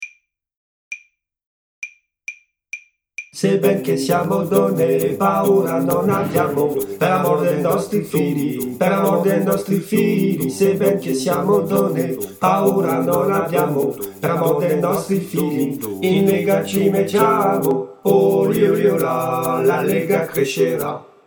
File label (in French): Trois voix, chorale; La Lega